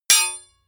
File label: hammer hit metal hard